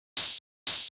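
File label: drum openhat, pulse train sound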